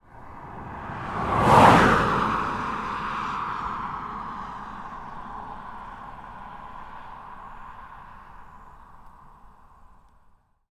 Car Quick Pass, Vehicles Land

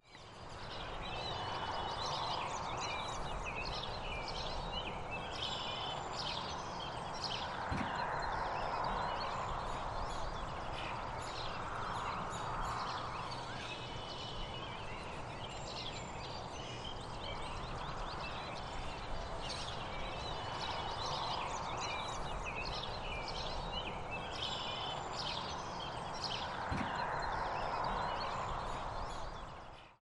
Peaceful Birds, Outdoor Ambience

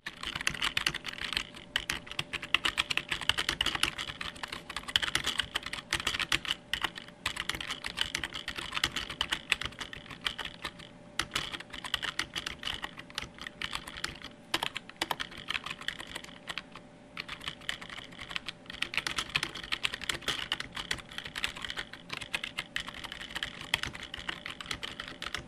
Keyboard Typing Office

